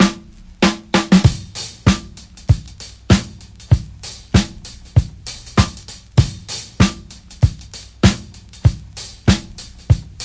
classic break, drum loops and breaks

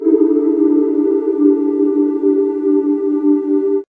ethno harmonic pad; Pads

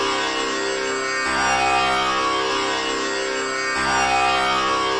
sitar drone; WORLD LOOPS

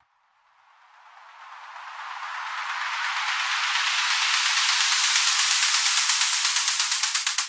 melbourne bounce noise sweep; Hip Hop; STYLE KITS; D3ron Melbourne Bounce Kit

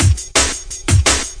170 tekstep big n flat, drum loops and breaks; 161 to 180 bpm

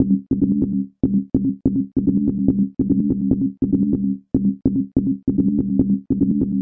lopass tone melody; SYNTH AND ELECTRONIC LOOPS

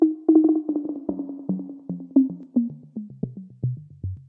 echo bops; SYNTH AND ELECTRONIC LOOPS

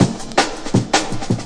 163 roller drum; 161 to 180 bpm

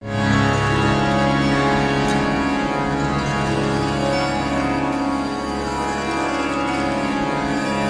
Bilaskhani Todi, Far East Drones and Plucks

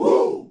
"woo", Voice

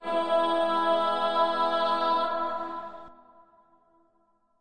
Slavic Choir E Aah; Slavic Maybe Bulgarian Choir; VOCAL

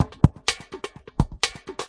126 bpm, wave paradiddle, drum loops and breaks

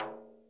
miyadaiko B3, Japanese Drums; ETHNIC and WORLD PERCUSSION

